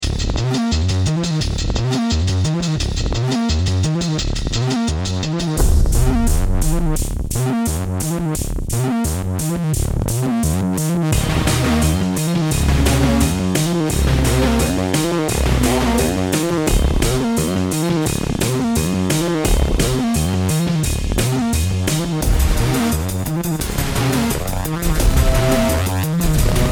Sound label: start round, music